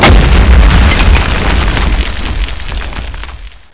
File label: explode